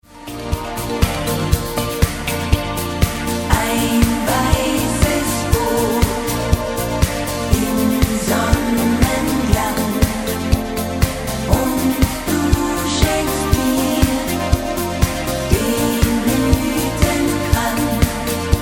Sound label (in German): mit Chor